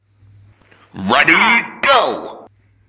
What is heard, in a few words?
Retro Ready Go